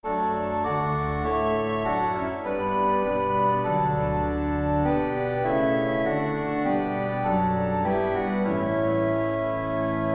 Organ, hymns without words

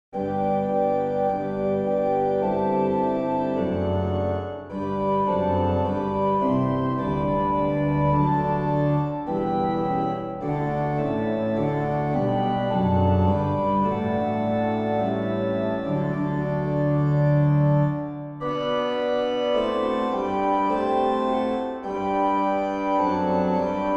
Organ and Trumpet Descant

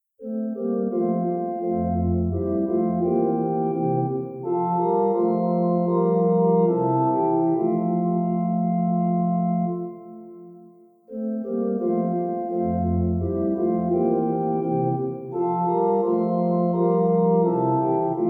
Organ; Infant Holy Infant Lowly; Wzlobie Lezy; 2 Verses

